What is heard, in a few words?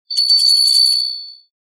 Wind tone; Samsung GT-E2510